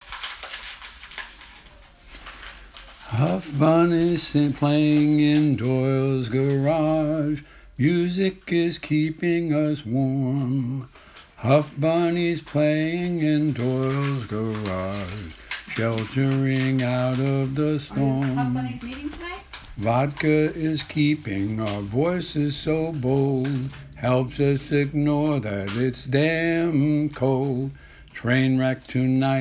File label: froggy singing